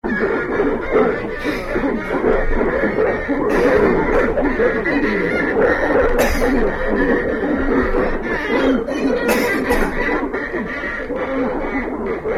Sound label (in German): husten violine